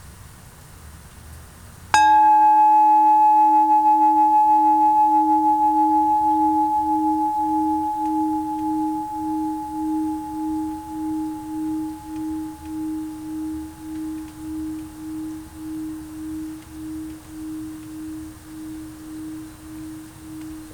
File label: chime